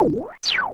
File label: Toad House Disappear; Wii